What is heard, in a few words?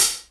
crispy hi hat one shot f sharp key; hat samples